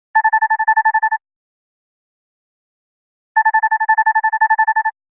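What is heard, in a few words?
trad, nothing phone sounds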